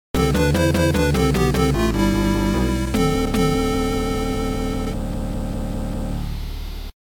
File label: Unused Music; Blood of Jurassic